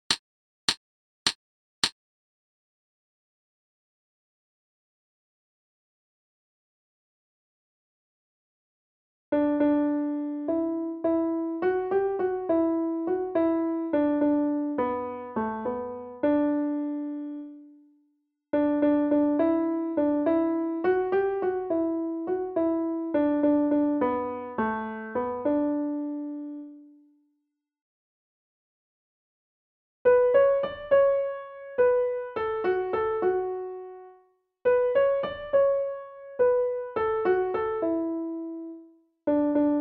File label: american lullaby melody